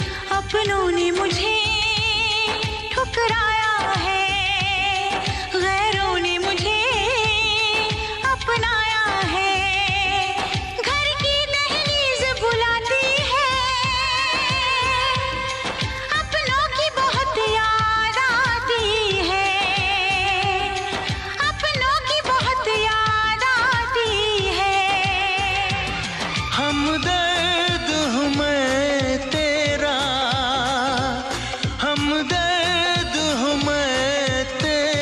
Sad Ringtone

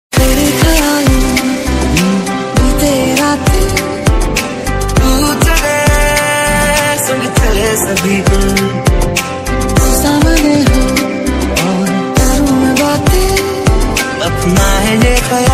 Ringtone

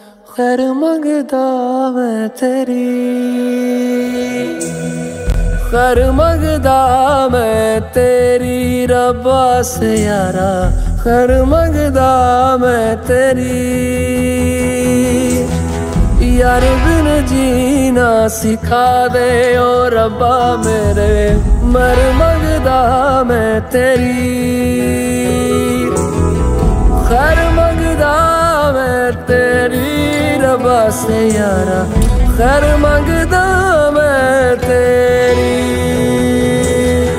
Punjabi Ringtone

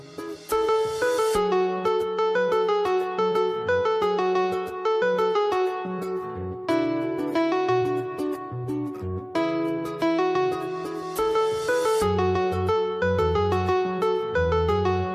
Instrumental

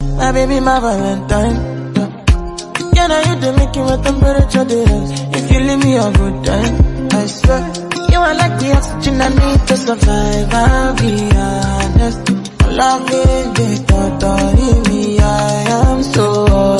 Remix English Song

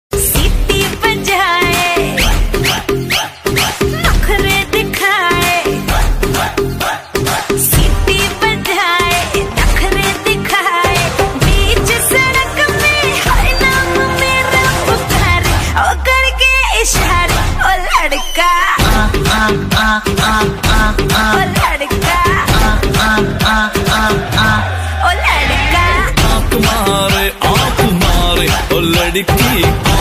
Female Ringtone

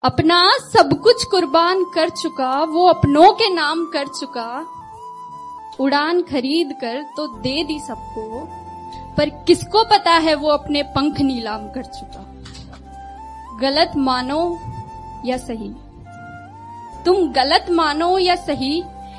Poetry On Father Song Ringtone

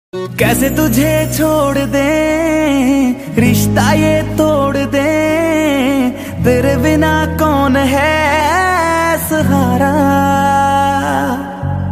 Punjabi Ringtone

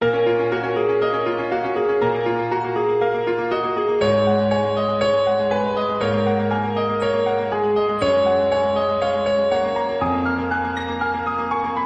Nokia Android Mobile Ringtone; ringtones

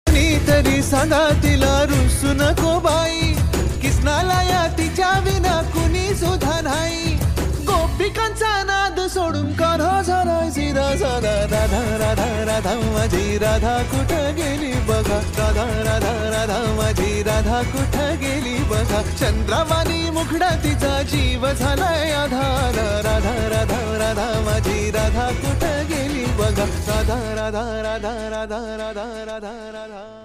Marathi Ringtone

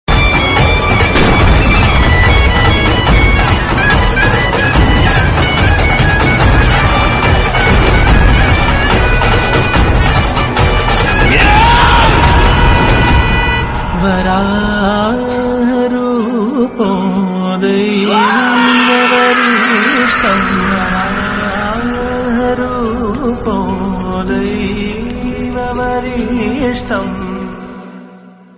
Movie Music Ringtone; ringtones; Kantara

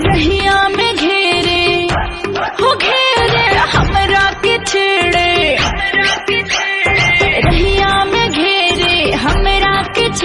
Bhojpuri Ringtone

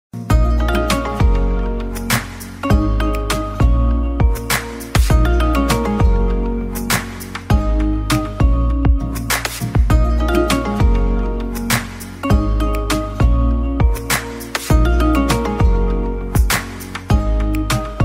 Ringtone

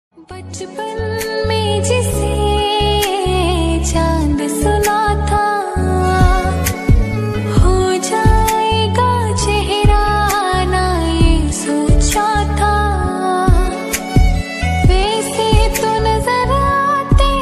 New Sad Song Ringtone

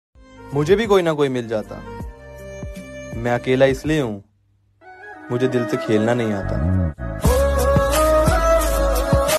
Breakup Ringtone

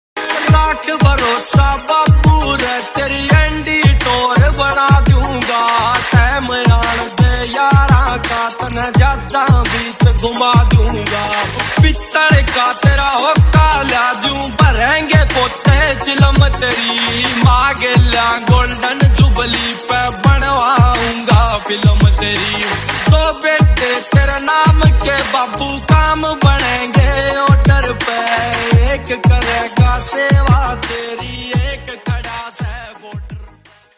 Haryanvi Ringtone

